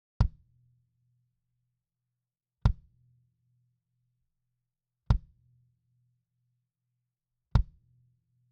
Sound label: Kick; Daking